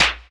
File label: sparkle steel snare drum a sharp key